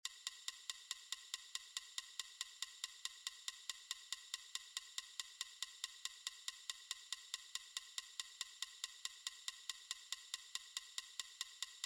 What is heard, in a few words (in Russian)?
метроном 280